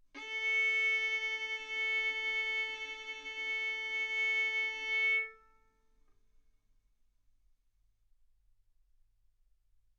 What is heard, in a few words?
Violin arco mf sul A A4 B4 stereo; single mic